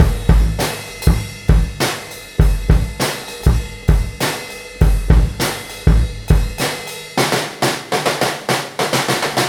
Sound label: Tama 101 Bpm